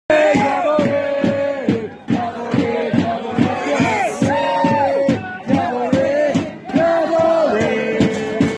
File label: chant; cavalry fc